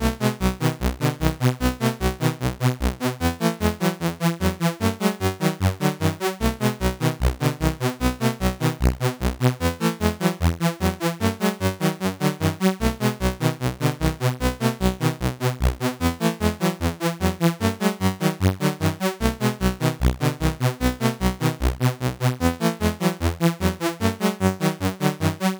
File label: c64 in game; lazy jones